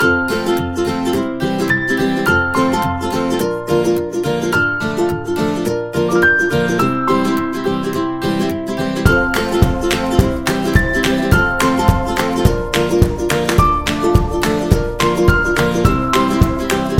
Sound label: Cheerful Folk, happy music